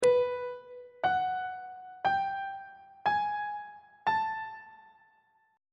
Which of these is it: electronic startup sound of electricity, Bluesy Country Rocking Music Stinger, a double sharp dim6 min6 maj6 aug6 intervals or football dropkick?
a double sharp dim6 min6 maj6 aug6 intervals